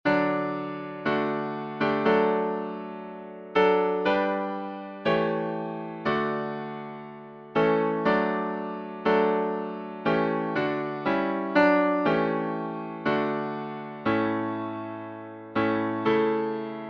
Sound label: Hymns